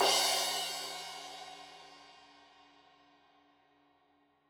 Crash 15in L edge, Cymbals Stereo

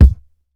TIGHT KICK, drums; BASEMENT DRUMS VOL 2

